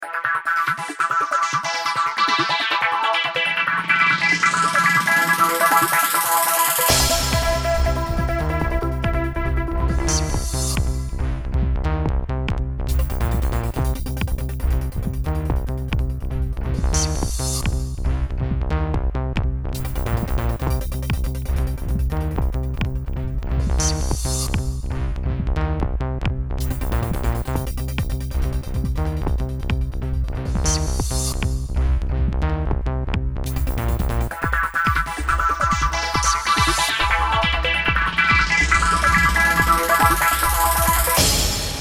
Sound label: News Electro; Corporate